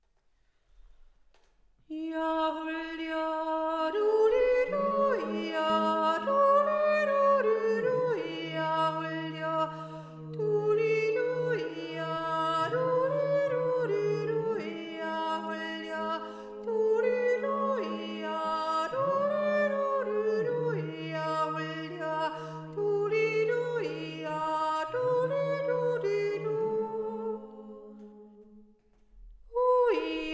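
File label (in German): Zwischenteil erste Stimme, Jodler; Jo hul djo du li di jo - du li du li du / Jo hul djo du li du jo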